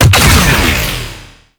Sentient Marrow Tick Death Explosion; Warframe; Tau

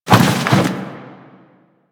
Roll Forward Foley; Warframe; Tenno

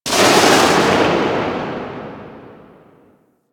Electricity Blast; Warframe; Ambience; Nineteen Ninety Nine

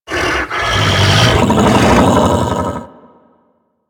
Infested Juggernaut Vocal Idle; Warframe

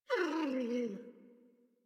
Puppy Idle Coo; Enemies; Vocals; Kubrow; Warframe

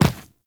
Medium Land Default, Footsteps; Enemies; Warframe

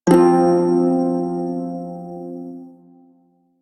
Shawzin Chromatic Chord; Warframe; Prime Shawzin

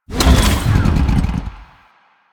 Dismount, Motorcycle; Motorcycle Ninety Nine

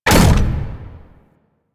Mech Shield Block; Warframe; Vehicles; NechroMech; Abilities

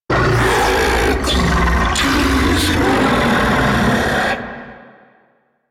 Octopede Attack; Warframe

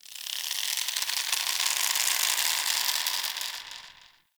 Rope Creak; Ambience; Dungeons; Under City

